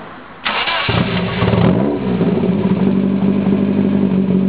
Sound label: exhaust start, Lotus